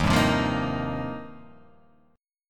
D sharp 6th Add 9th, D sharp, down; strummed; JGuitar

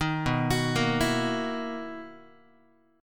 B-Major 11th Guitar, BM11 chord; arpeggiated; JGuitar

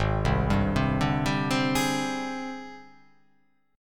G sharp Major Sharp 11th

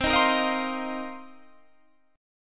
C Minor 11th C Guitar Standard; strummed; JGuitar

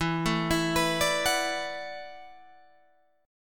E 9th Suspended 4th E down Guitar Open G

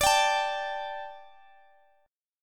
D Suspended 4th D, Guitar, Open D

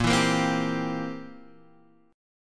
C 7th Bb

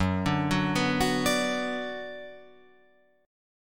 Gb Suspended 4th Sharp 5th, Guitar, Open F; Gbsus4#5 arpeggiated; 6,9,6,6,9,9

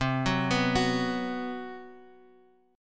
B 5th C, chord; B5/C; arpeggiated; JGuitar

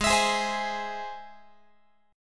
G sharp Augmented, Guitar; strummed; x,16,19,18,16,19

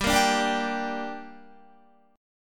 G Minor G down Guitar, chord; Gm strummed

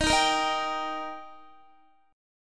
Eb Major Eb down Guitar 4F,4A,5C,5G,5C,6E; strummed; JGuitar

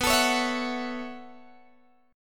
B 9th Suspended 4th B down Guitar Open G

